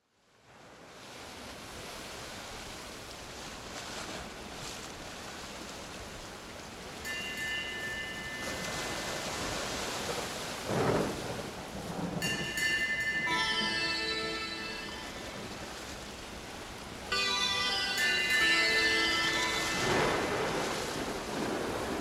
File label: accompaniment